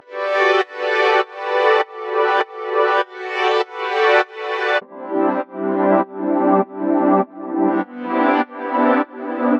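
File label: 100 G major Synth Turtle Wax; Zero-G; Future Wave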